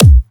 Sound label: Dubstep Kicks